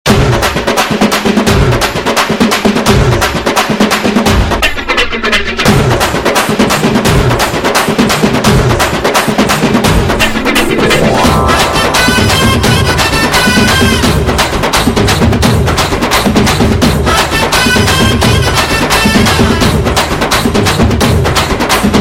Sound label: Fast Beat, reggae